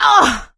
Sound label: hurt minor, survivor, voice; source engine; left 4 dead 2; producer